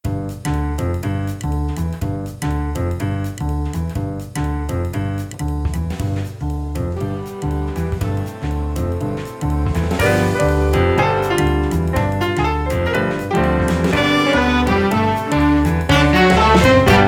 Elevator; peppa pig world adventures

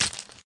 Candy Break; Cut the Rope

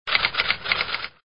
Typing

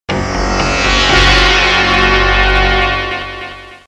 Monster Appear

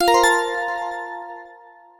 SFX Ultimatum Success; Imagine Babyz; 3DS

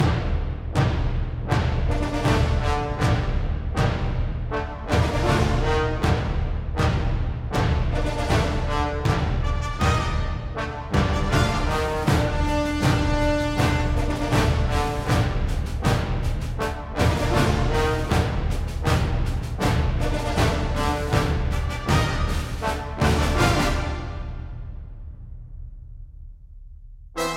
Escape Loop; Cruiseship Escape

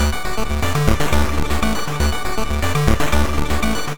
tiny loop 8bit; Moon

